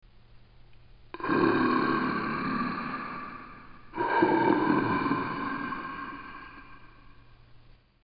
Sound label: zombie; dod